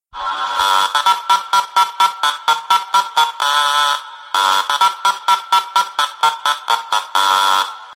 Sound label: Trum pet